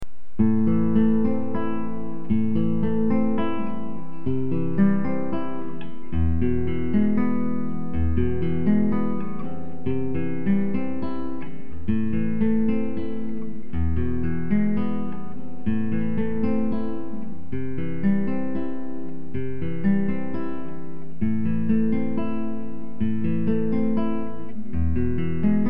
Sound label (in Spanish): ejercicio acordes, guitarra